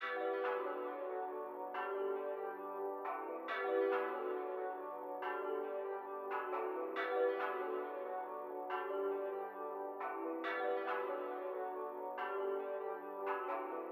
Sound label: Pad